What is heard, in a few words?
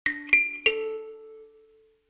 notification kalimba rattle triad up major